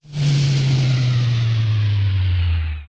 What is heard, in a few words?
Platform Descend; Jabu Jabu